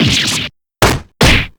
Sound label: damage